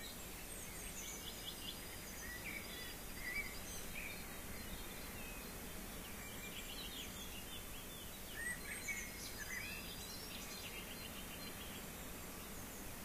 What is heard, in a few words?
rayol farm; SEAMED